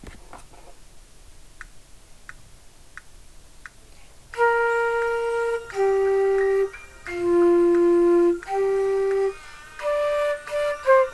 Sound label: 88bpm